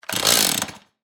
Chainsaw Pull, Industry, Chainsaw, Pull, False, Start, Stihl, Pro, Ms, 260; Blast Wave